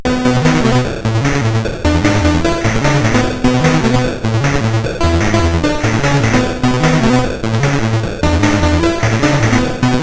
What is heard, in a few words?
Synth Sample III, CSG8580R5